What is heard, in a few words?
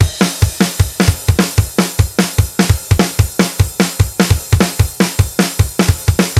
Punk Drum Loop